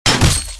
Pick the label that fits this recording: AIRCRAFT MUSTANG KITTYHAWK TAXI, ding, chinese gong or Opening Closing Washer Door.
ding